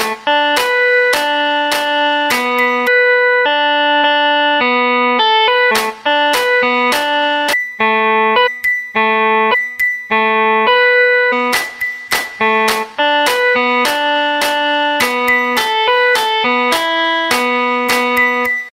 Nokia E55 Piano

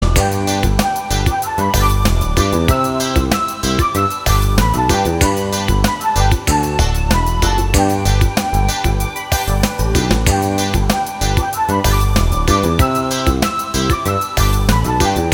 Bangla Ring

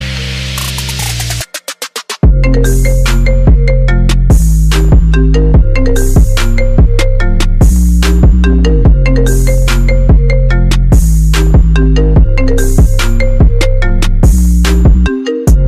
Trap

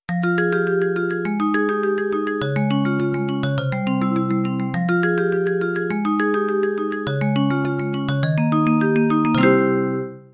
Samsung S23 Recognition

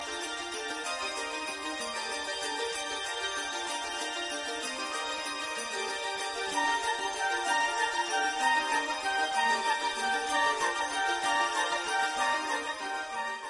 Advent Melody Sms

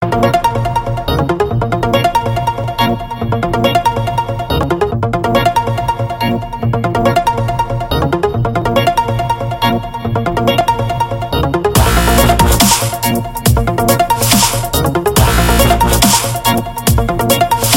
dubstep

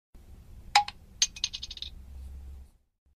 Open Bottle; WhatsApp

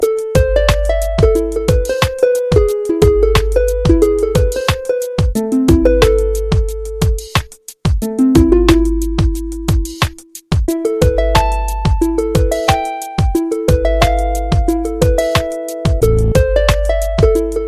Soft Dream

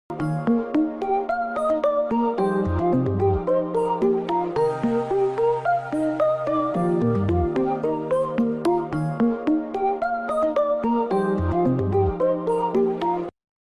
SAMSUNG GALAXY M51

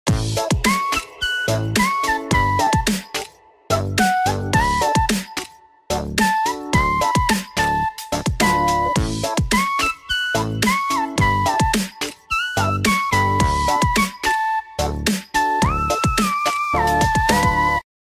Samsung Carnival, sonnerie

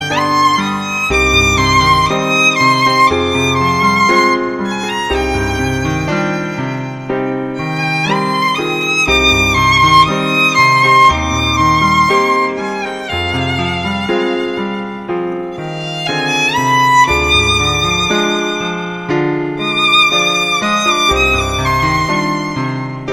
Violin and Piano

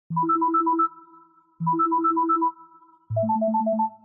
LG W10 Sms

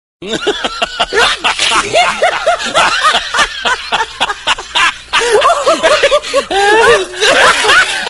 Monkey Laughing

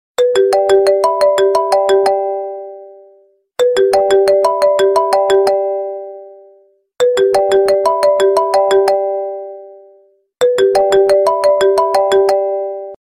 iPhone Loud

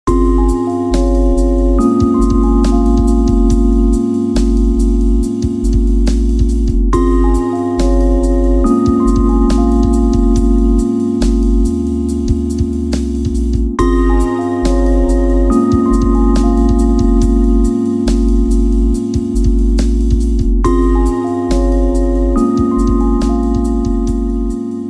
Quiet sunrise Alarm

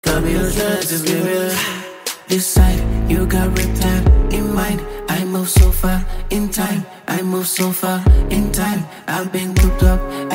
Trance

